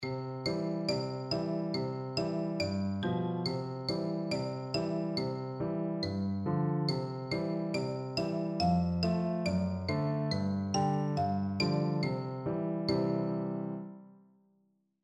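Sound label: xylophone, percussion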